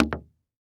Foley Footstep Hard Sole Shoe Single Step On Solid Wood Platform; Foley Footsteps